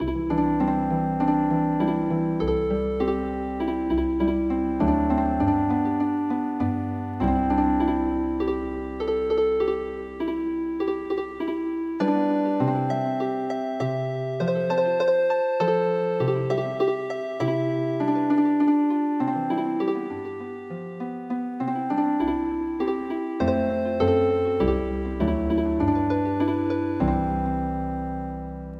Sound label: koinobori Healing Harp